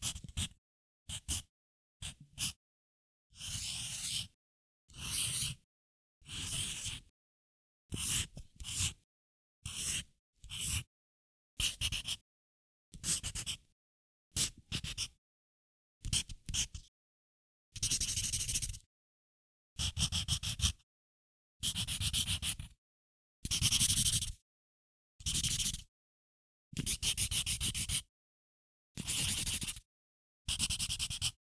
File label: Pencil On Paper